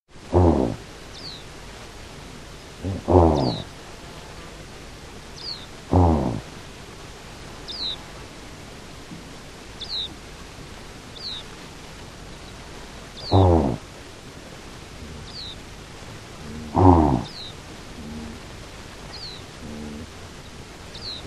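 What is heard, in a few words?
antelope voice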